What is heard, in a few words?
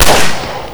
p38 shoot, weapons